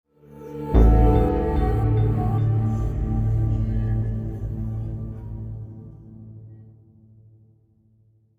Demons Stinger; The Walking Dead: The Telltale Series

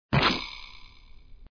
Hit monster

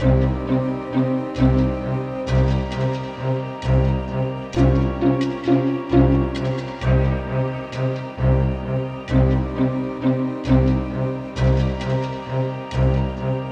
Cavern (66bpm) LOOP; dark water slime invader

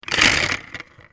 Reward Start Spinner; Doodle Jump; 3DS, Android, Arcade, DS, iOS, Mobile, Xbox 360

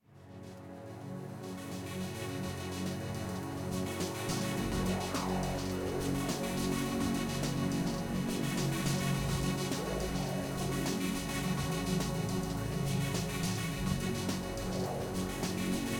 Nightmare-Music; Super Mombo Quest

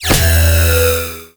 Boss Attack

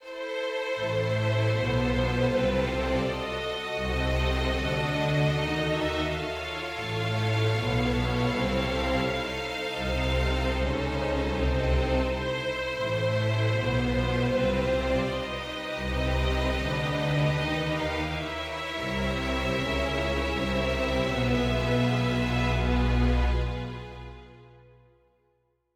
dark forte; quivr ps4 windows gamerip 2016

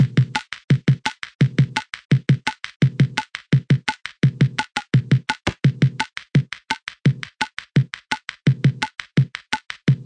Drumz; GONNER; Sopp Drumz

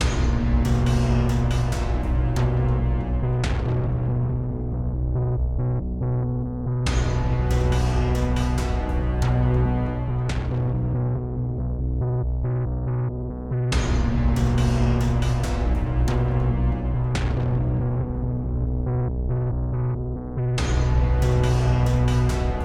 Menu Loop; Forbis Loop; Dungeons & Robots

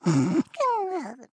Monster Sleep; Cut the Rope: Time Travel

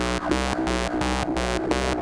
Danger Wah

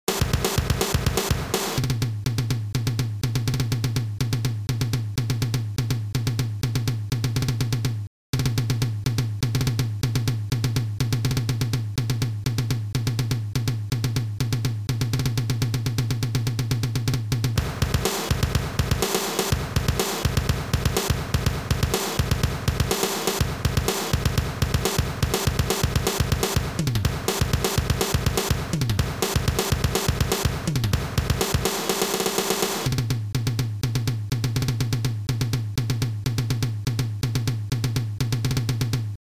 Main Bgm; m.i.a. missing in action

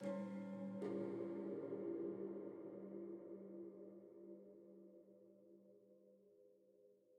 Env Field Night Cave

